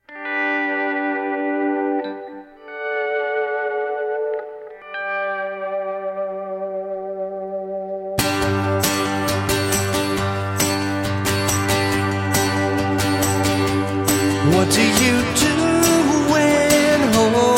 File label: Acoustic Version